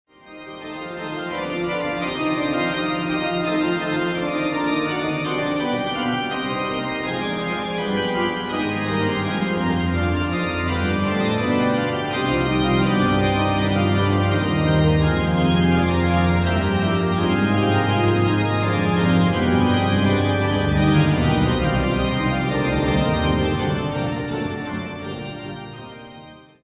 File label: fugue, organ; j.s.bach; bwv582; tracey